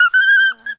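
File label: squeal of dog that pinched its tail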